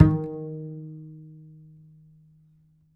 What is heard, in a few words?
D, Upright Acoustic Bass; Larry Seyer; SWITCHABLE POSITIONS